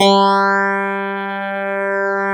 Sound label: Clavinet G3; USB Soundscan vol.09; AKAI